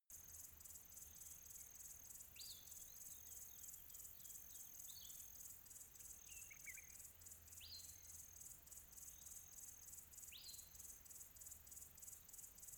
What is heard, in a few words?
quiet tropical birds atmo